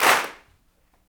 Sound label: CLAPS, Africa Handclaps